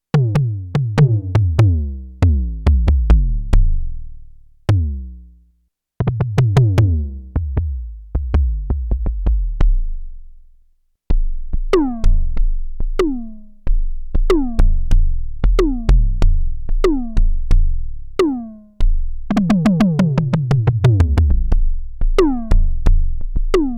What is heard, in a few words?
Toms, Roland JX-8P